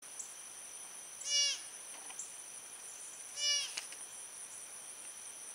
fawn bleat deer call sound

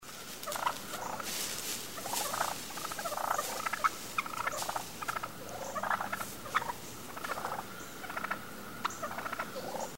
purr turkey call sound

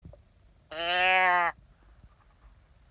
doe bleat deer call sound

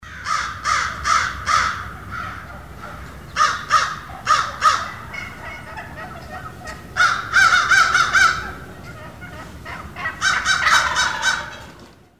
crow call

